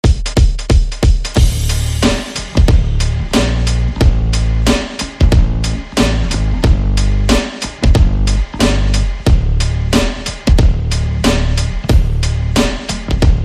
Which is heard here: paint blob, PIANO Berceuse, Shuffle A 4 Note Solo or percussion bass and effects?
percussion bass and effects